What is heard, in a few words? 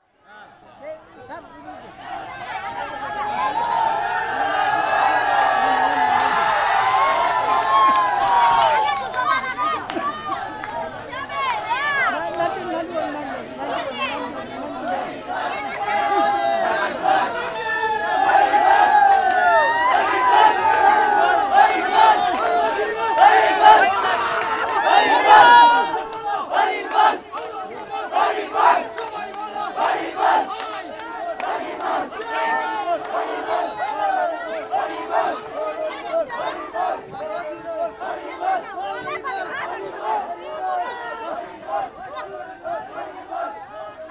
Hindu Rathayatra, Bangladesh